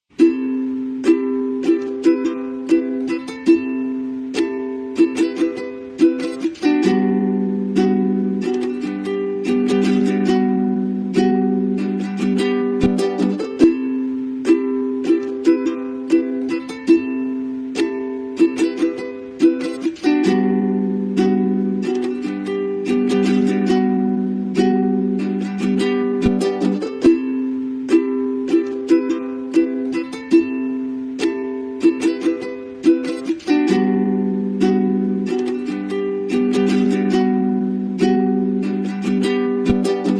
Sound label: instrumental; prod digger